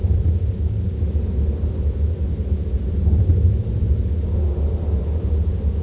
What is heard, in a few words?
wind, ambience